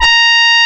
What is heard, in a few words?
A#, Synclav FM, BRASS; Big Fish Audio